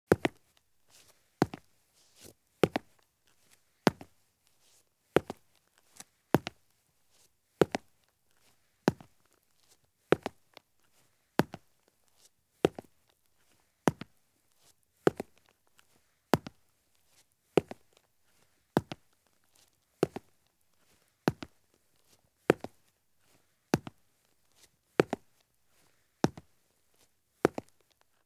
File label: Police Boots Walk at Slow Pace on Concrete; Sound Ideas; Footstep Sound Effects